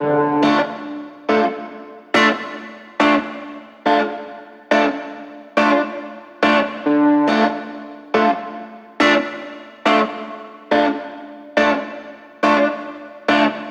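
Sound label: 140BPM D minor Guitar FX, Offbeat Dub Guitars; Antidote Advent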